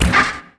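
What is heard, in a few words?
attack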